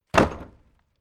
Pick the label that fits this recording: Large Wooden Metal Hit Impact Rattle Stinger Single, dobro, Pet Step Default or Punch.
Large Wooden Metal Hit Impact Rattle Stinger Single